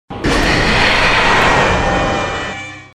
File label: scream jumpscare; five nights at freddys 4